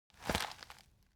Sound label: object plastic plastic bag hit soft; smartsoundfx